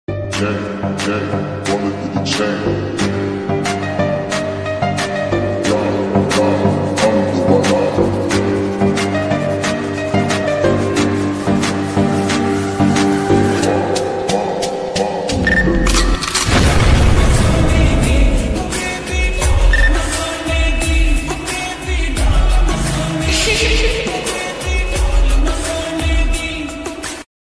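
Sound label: attitude music background music; trending no copyright bgm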